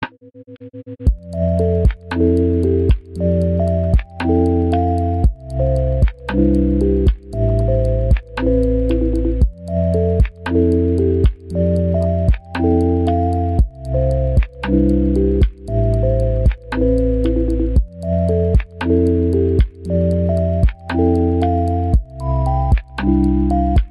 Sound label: Epiano burn marks